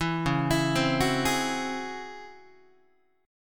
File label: Db-Minor Major 11th Guitar, DbmM11 chord; arpeggiated; JGuitar